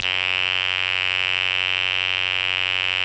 SQUEEK, LEADS; Masterbits; Vintage Voltage

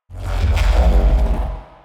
neuro, bass

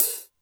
Cym Clutched, Drum Machines Alesis D4

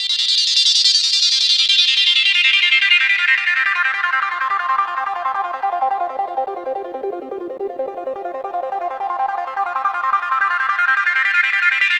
gro 160, Hardcore Loops, Arpeggios

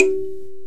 KALIM A, KALIMBA; AKAI S-Series CD-ROM Sound Library VOL-5

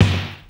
Fairlight CMI III Snare, Drum Machines

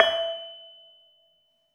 LAMELS E4, Asian Traditions; USB Soundscan vol.60; AKAI; LAMELOFO

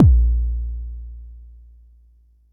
Bassdrum, Jomox Xbase09

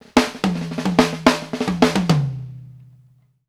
STANDARD, FILLS 108; Sampleheads - New York City Drumworks VOL-1

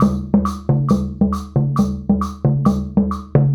Wood Block and Djembe; Combo

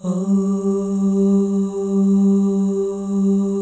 OH, FEM, Symphony of Voices; Spectrasonics

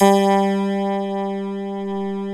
HRP RCDR&FLT

